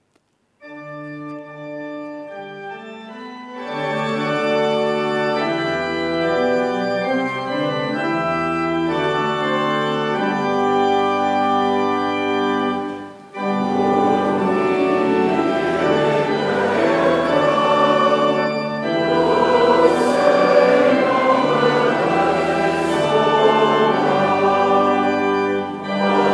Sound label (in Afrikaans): Gemeentesang; Psalm 136-1 vers 1,12,20